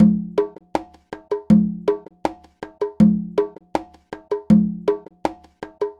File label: Conga, Latin Percussion